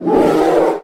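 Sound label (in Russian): enraged huge brown bear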